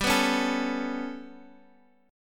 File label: B 7th Suspended 2nd Sharp 5th, chord